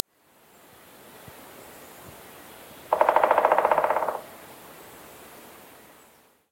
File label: Dryocopus pileatus - Pileated Woodpecker